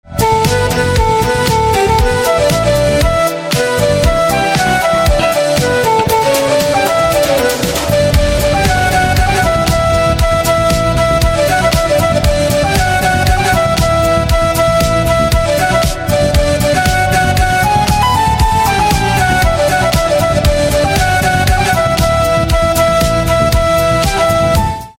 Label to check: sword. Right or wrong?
Wrong